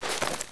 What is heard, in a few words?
rpg, weapons